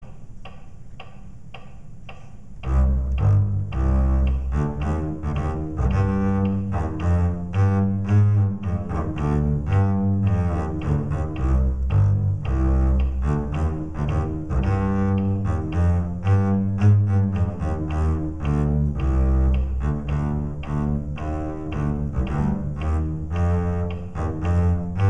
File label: farandole fast bass